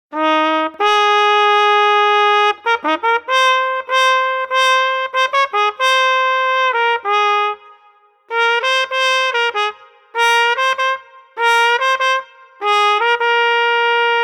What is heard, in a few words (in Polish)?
Trąbka